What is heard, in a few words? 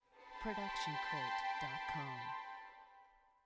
dream strings